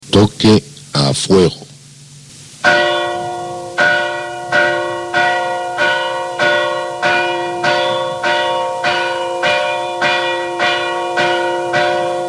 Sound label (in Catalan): campana